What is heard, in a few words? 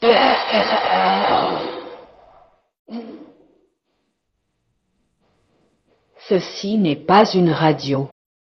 jingle